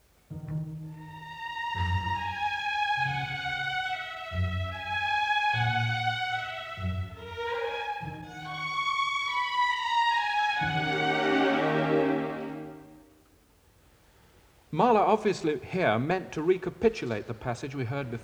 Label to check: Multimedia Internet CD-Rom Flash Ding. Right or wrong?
Wrong